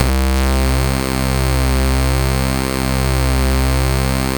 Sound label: Progressive SID C2; chiptune